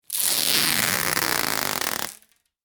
tape sticky rip; Sticky Tape Being Ripped / Pulled From Roll; Offices

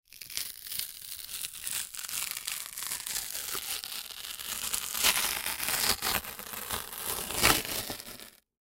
Cardboard Rip Tear; Cardboard Rip Tear Long; Foley

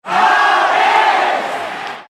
Cheer Crowd Baseball Japan Chant; Crowd cheer at baseball game in Japan. Japanese crowd chant.; Baseball Sound Effects

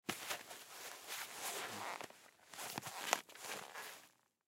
Hockey Gloves Hand; Sports; Hockey Gloves Move; Blastwave

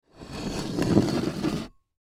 Bowl Slide, Bowl Slide Wood Table Dish; Household; Dishware

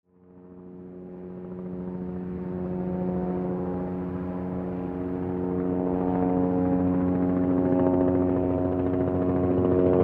Helicopter Fly Overhead; News Distant; Helicopters

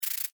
Insect Rattle Shake, Bug; Animal; Insect Movement Sound Effects

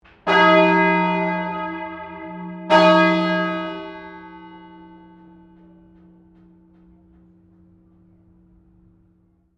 Bell St Pauls Cathedral Melbourne Bell 2 Rings

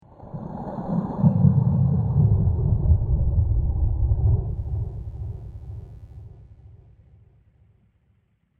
Underwater Bubbles; Water Sound Effects

